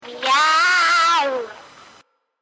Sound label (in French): Chat